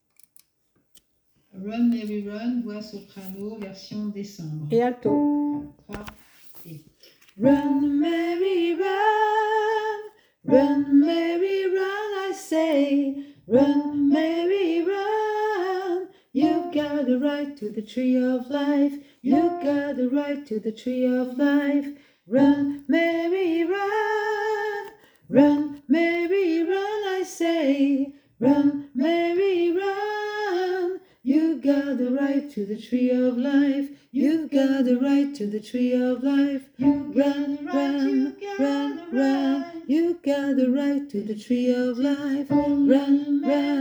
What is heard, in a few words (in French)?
ALTO EN FOND SOPRANO